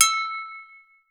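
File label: brake drum, metal bells; Voice Crystal - Steve Reid Definitive Percussion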